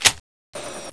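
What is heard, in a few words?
4 barrel reload, weapons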